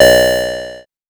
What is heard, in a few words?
Synth Sound; Vengeance Samples; VEE Synth Shots